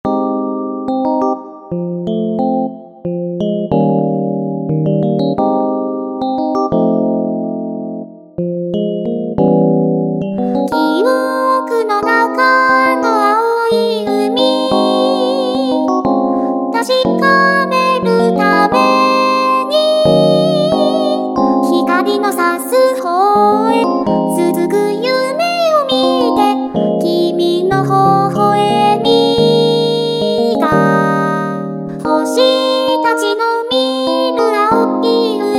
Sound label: REAKTOR PRISM